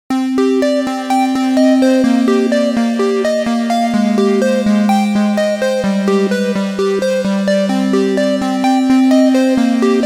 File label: mod analog tones; opxpro